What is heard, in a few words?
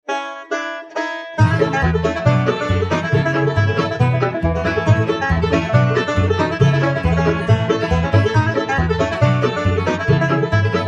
Shonan Breakdown, banjo, bluegrass